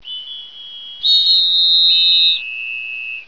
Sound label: whistle; overlord